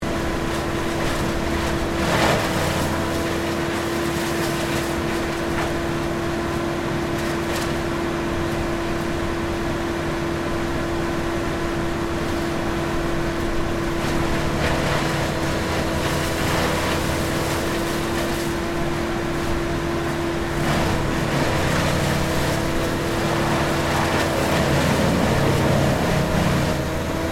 Industrial tree schredder Vermeer BC1800 operating, Tree shredder operating; Grinders; Machines